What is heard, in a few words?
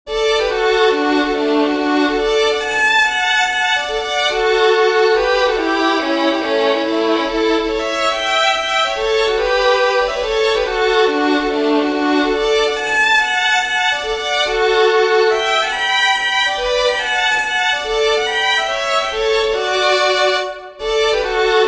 WALTZ with harmony, fiddlers; GRAM LEES